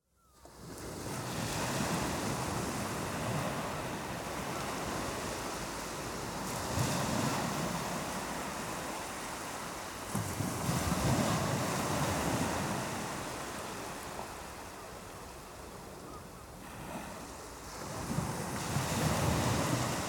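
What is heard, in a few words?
ambience, English Coast Waves Distant Seagulls; noise creations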